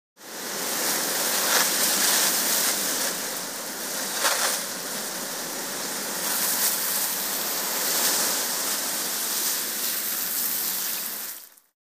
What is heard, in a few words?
Water flows in the shower